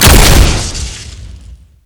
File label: gauss rifle; garrysmod